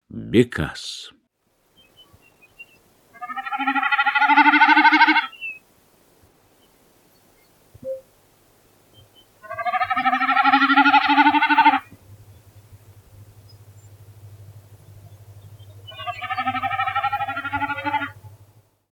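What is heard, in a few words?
snipe; Bekas; Golosa ptic i zverei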